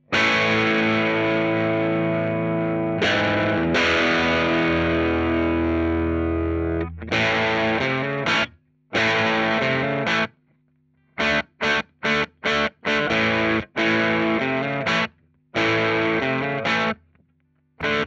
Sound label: distorted, amps; mamboo; booteek amps handmade by marshall heiser